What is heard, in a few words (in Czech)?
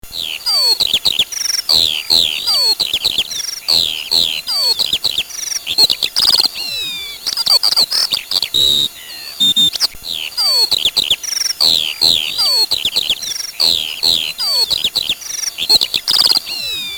Skrivan polni